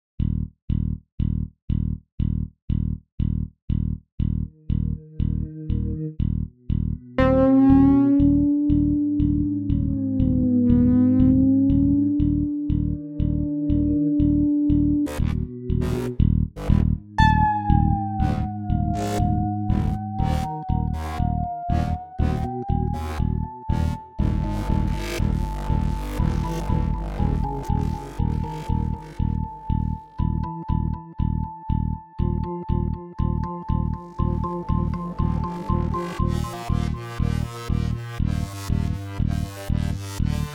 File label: MIDI ditty; spellman studio